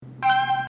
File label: NOTIFICATION RINGTONE; GTA 5; FRANKLIN